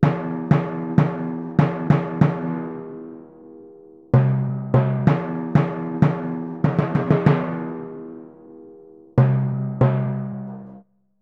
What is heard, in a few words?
95 e min timpani, Orchestral arrangements